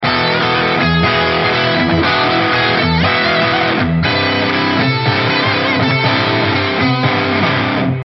bass drums

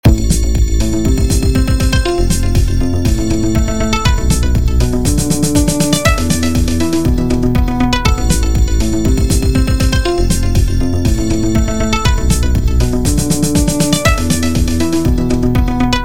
Bells

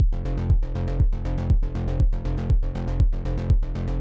Kick Bass

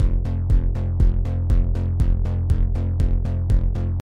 Kick Bass

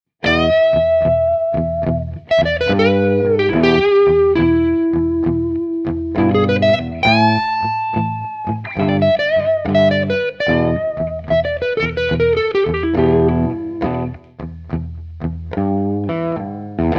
bend vibrato; Причудливые вибраты и бенды

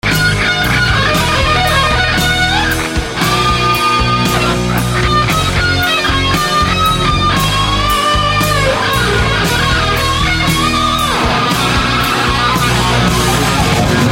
rock

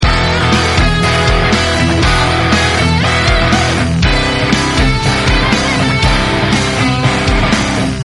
sample w REV

